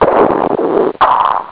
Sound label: Rumble